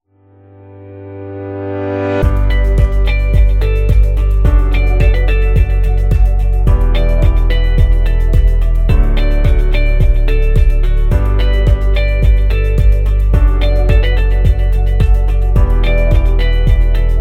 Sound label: Soft Instrumental